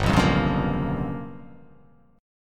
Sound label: G 7th Sharp 9th Flat 5th